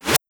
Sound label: snare reverse